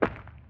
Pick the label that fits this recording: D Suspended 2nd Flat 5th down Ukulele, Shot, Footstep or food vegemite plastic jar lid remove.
Footstep